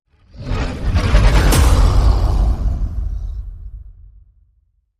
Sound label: Not Human Monster Hit